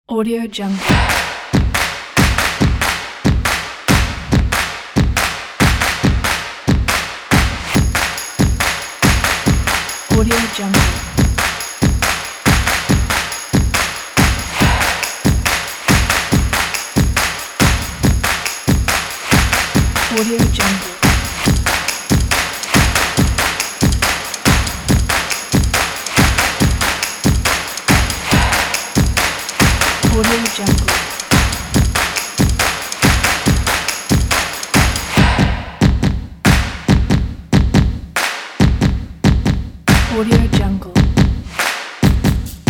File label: stomps percussion typography